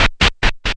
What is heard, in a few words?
Move